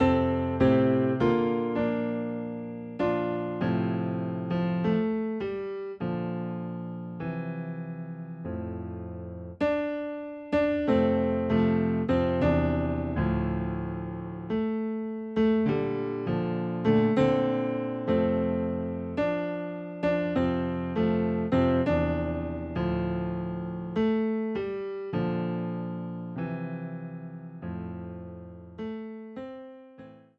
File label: Give Me Jesus, Piano Low in F